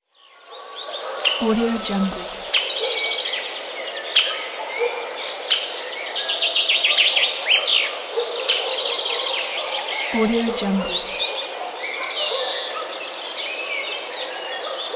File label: forest ambience near village with woodpecker